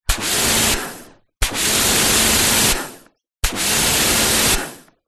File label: industrial pressure washer k2 on run spray short bursts x3 close to nozzle; kartcher; zapsplat